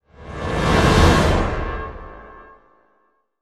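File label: sting, horn fast; transformers war for cybertron gamerip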